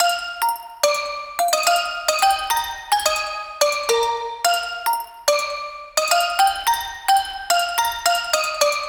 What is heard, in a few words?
108 bpm Eb Major Melody Idea